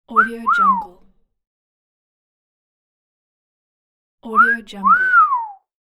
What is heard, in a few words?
wolf whistle